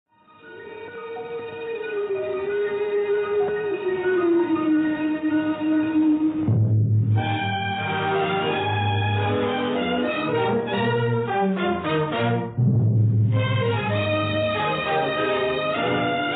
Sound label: Title Music Instrumental